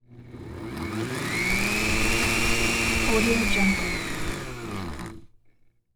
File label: blender with whipped cream; prosoundfx